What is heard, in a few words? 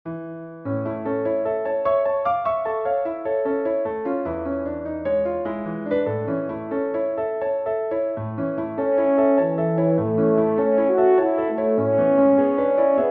for horn piano; Raff, Joachim; paraphrase; bist wie eine blume